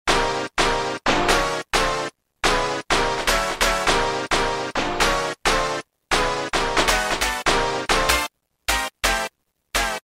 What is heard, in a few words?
Kutt Hit; Roland M-DC1